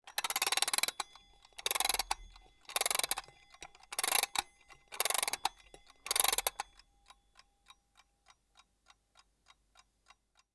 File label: Setting Alarm clock